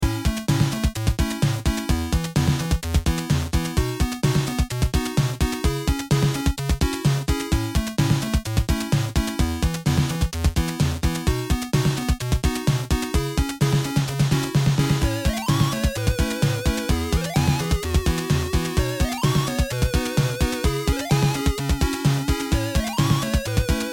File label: beat 62